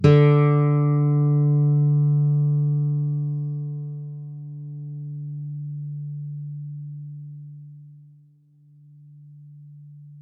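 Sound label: Clean D String Pick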